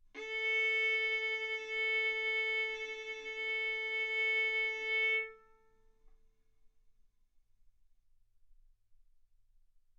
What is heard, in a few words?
Violin arco A4 B4; mf; sul A; stereo